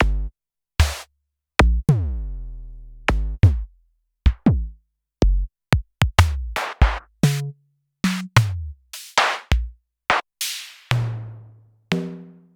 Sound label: Clavia Nord-Drum